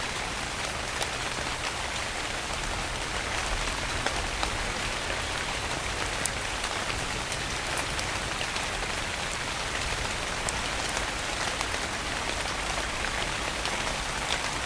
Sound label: Rain Sounds, The Rain in Spain